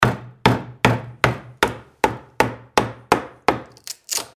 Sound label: hammering